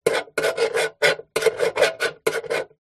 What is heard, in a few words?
office pen biro draw shape on metal box